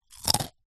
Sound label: human eat crunch bite single rice chip